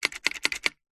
household telephone vintage rotary style pressing switchhook hook fast; disconected